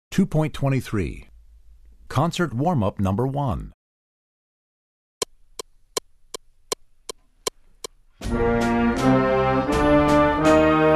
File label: Concert Warm-Up